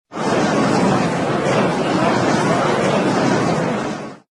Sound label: gallery